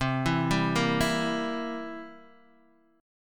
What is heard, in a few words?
C 7th C down Guitar Open D